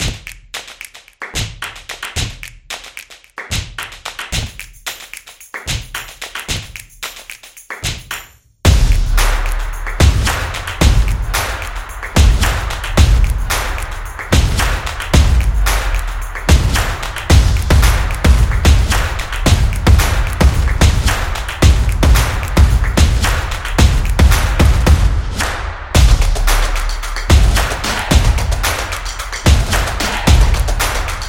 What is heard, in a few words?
Stomping Beat